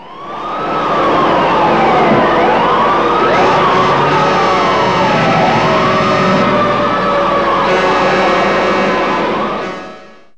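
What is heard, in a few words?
fire truck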